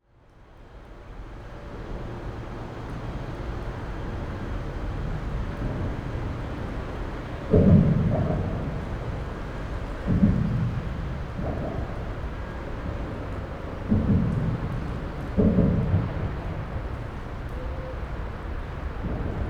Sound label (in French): Pont Verdun; les boucles de l'isère; voix d eau